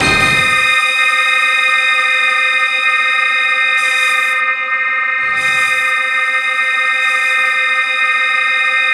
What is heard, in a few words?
C5, Synth Line; 90 s Sample CDs; NiceBeats; SynthLine VOL-11